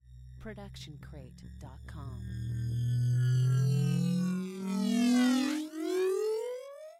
Laser Charge Up; SoundsCrate

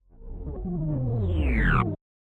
Machine Charge Down; SoundsCrate

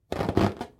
balloon rub short

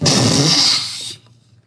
balloon deflate short

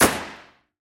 Gun Shot; soundscrate

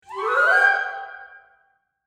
woodwind run up

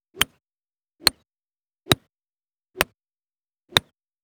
golf club rescue mono; soundscrate

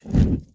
Fire Lighting Burst; SoundsCrate

